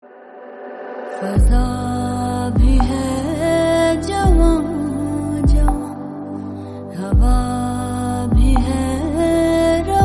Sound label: hindi song